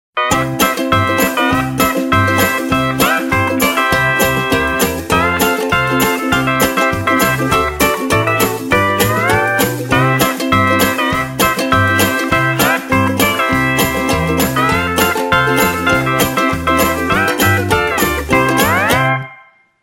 Jingle Bells Hawaiian Holiday Christmas Eve Ringtone